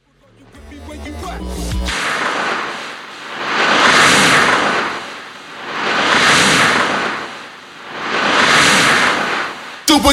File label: Super Tekno Shooter